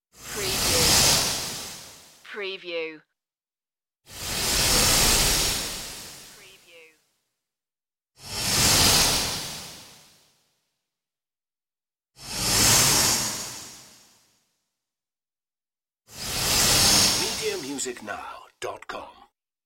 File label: magic swirl swooshes; magic spells fantasy; the sound pack tree